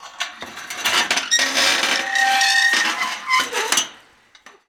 Gate Metal Fence Open; SFX Kit; Doors

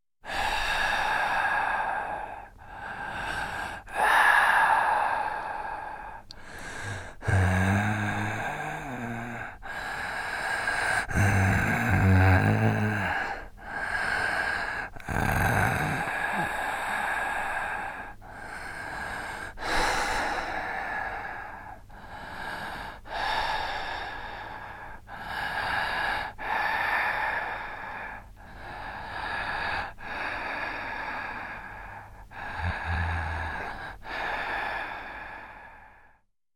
Breathing, Scary Sounds; 1001 Sound Effects; Halloween Horror Sounds Effects

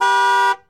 Truck Ford250 Horn; Trucks

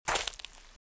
Footsteps - Leaves - Walk - Left; RadwinIsland

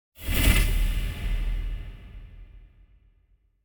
Impact Muted Hit Reverb; Hollywood Edge; sound designer's toolkit v2

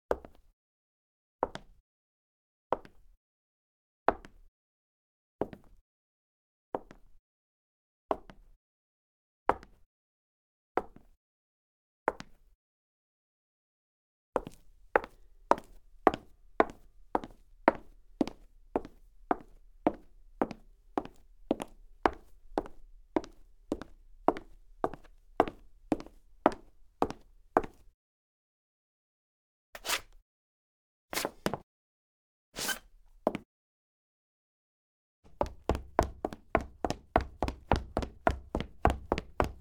Wood Hard High Heels, Foley Footsteps; Sound Ideas

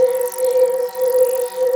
Scifi Synth Tone Loop; SFX Kit; Sci-Fi Loops

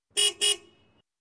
Truck Horn, Double Blast; Sound Ideas 2000 Series

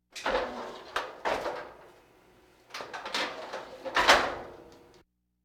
Laugh tracks

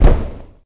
Boxing Ring Bodyfall

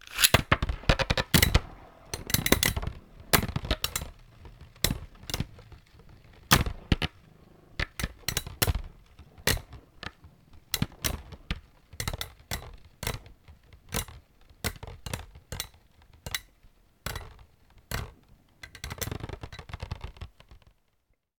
bayblade; NS Studios - ultra sound collection

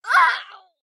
town human road hit; Blind Drive

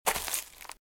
Footsteps - Leaves - Walk - Right; RadwinIsland

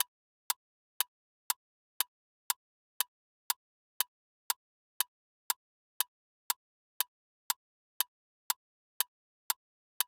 Bomb Timer; Clocks, Watches and Timers; Blastwave

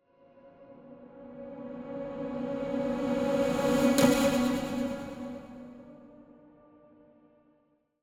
Symphonic Hit Reversed And Pulsating Pizzicato; Hollywood Edge; sound designer's toolkit v2

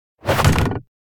Arrow Whoosh Impact Into Wood; Hollywood Edge; sound designer's toolkit v2

